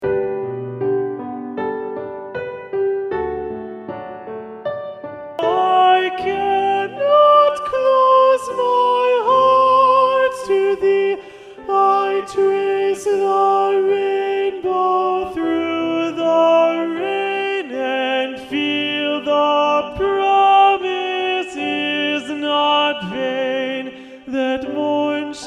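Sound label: Alto Predominant, SATB Honor Choir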